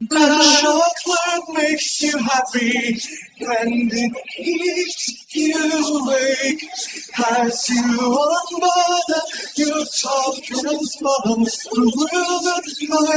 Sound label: chocolate makes you happy vocal